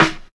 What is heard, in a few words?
natural steel snare drum sample e key